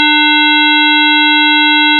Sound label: 300Hz 3900Hz odd multiples